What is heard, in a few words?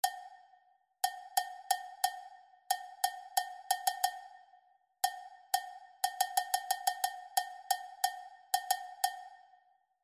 rhythmic error detection